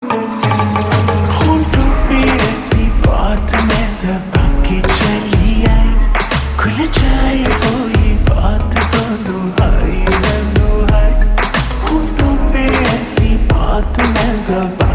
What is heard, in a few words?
Ringtone Slowed Reverb